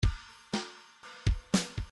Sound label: bdf midi file grooves rock b rock b